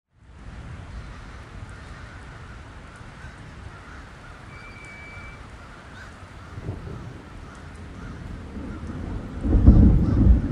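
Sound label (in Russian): осень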